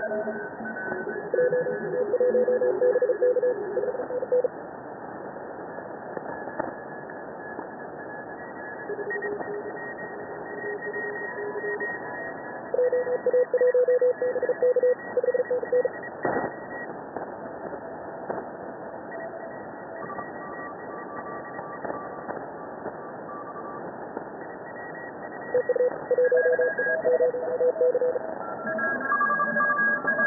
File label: 30m staccato CW